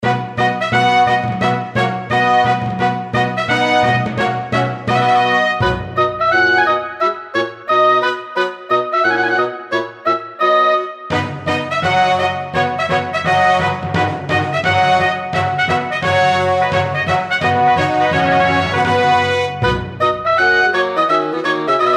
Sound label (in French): GAVOTTE DE LA COUR